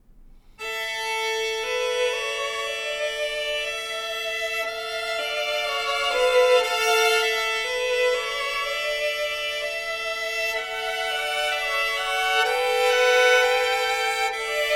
Duos for Two Violins, Wedding Song

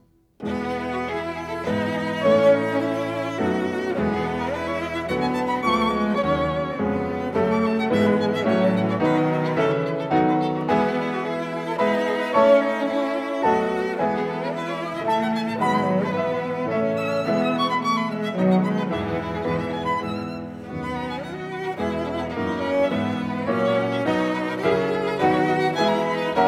Piano Trio in A Minor II. Variation 2

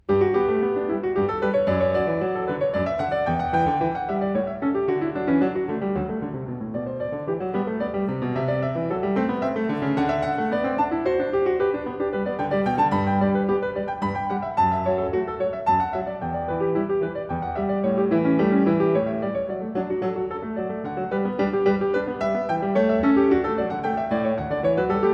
Goldberg Variations Var. 1. a 1 Clav; Bach; BWV 988; Live at Carnegie Hall, New York, 2025

